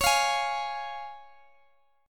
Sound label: Db Major Db down Guitar DADGAD